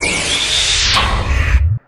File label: exertion